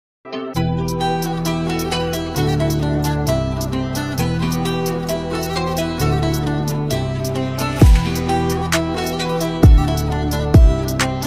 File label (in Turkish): telefon zil sesleri güzel bir ses